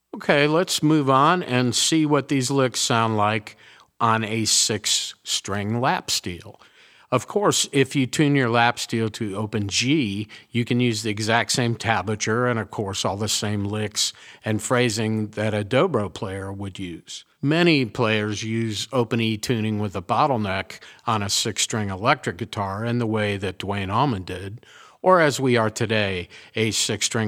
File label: resonator pedal, steel guitar